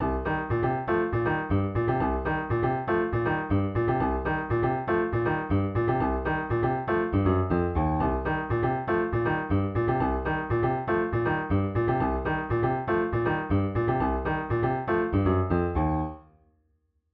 funk riff, piano

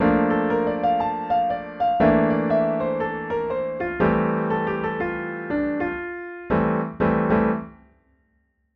2 5 1 lick jazz piano; improvisation; jazz music

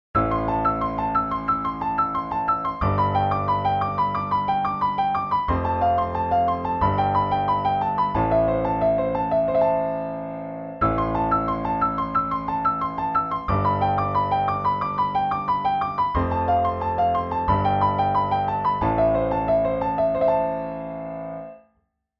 rock piano fast 16th notes

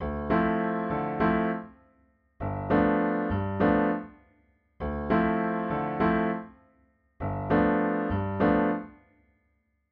funk 2 5 segment simple

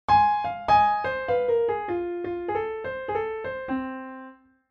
Blues Beginnings

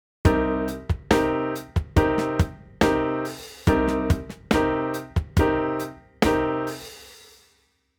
funk piano rhythm chords

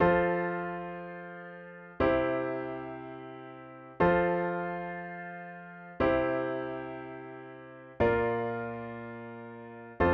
Ballad piano progression w LH bass

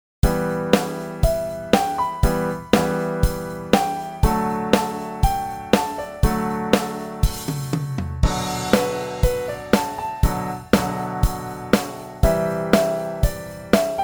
rock piano pentatonic soloing; rock tune

